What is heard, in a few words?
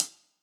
Acousti Hat Hit, hihat; ultimate hihat; Hits